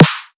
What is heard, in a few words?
dubstep snare